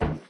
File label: Metal Walk